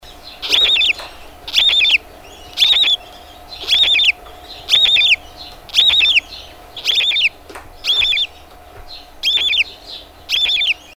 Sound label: barn owl egg